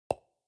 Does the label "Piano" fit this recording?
No